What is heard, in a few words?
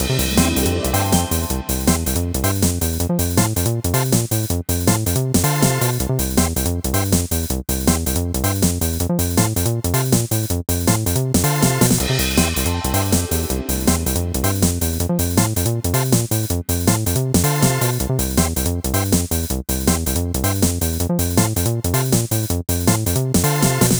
bgm lobby